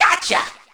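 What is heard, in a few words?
attack punch; roblox; coolkidd; slender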